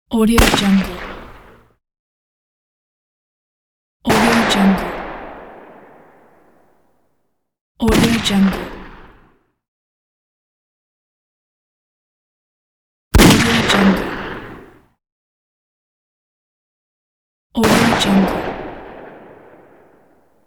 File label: gunshots